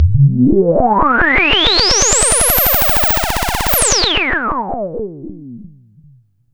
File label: SYNTH FX